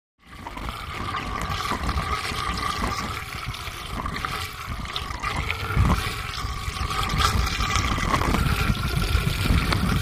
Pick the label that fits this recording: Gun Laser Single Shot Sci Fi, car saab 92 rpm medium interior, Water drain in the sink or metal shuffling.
Water drain in the sink